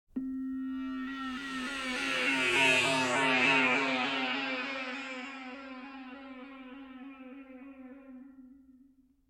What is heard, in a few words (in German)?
ufos vorüberfliegender satellit; Science Fiction